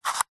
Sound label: battery tester plastic slider slide